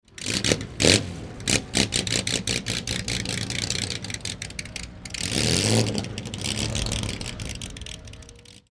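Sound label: BCM Historic Special, engine; 2003 Skope Classic; Ruapuna Raceway, Christchurch, New Zealand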